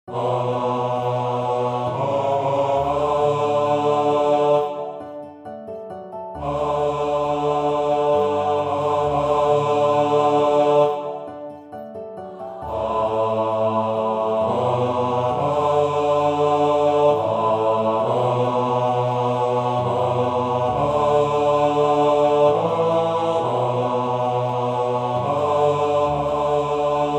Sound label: Bass